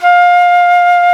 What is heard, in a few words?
Flutes, Flt amb